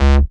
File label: Bass C#; Offbeat Bass; Vengeance Samples